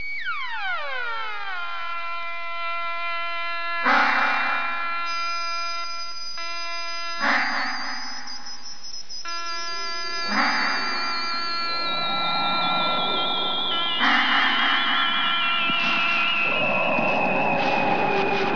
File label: scifi